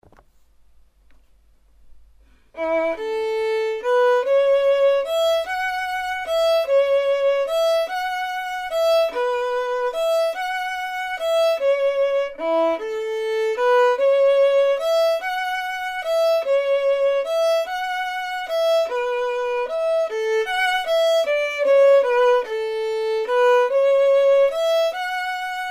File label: vln perf